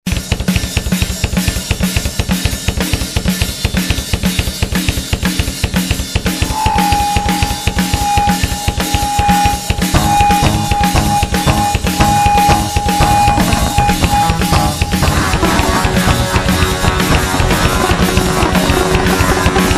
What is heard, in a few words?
Machine God Noise